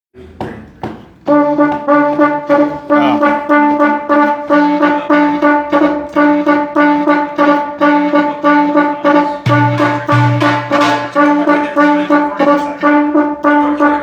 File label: Hicazkar Groove